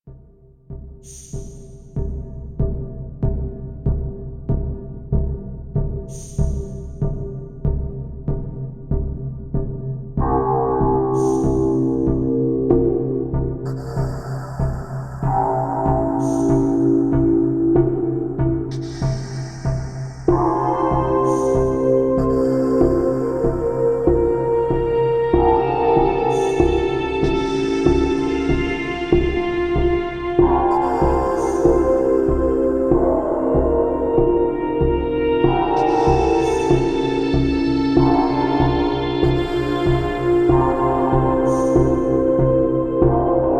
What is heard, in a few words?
BG Music; Warframe; DE; Night Wave Radio; Nora Night Episodes